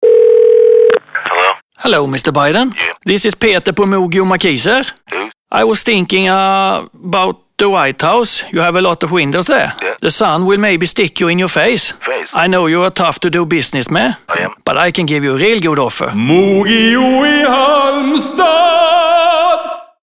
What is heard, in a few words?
Moog